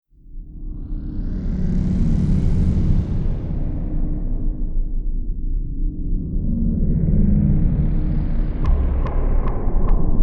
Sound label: Cinematic